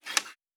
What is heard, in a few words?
Arrow Handle; Survival Game Sounds - Lite Edition; Combat and Hunting